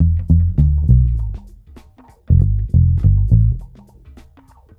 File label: Bass Loop, Ambient Grooves